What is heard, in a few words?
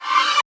horror ghost scream